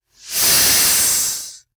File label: snake hiss; soundscrate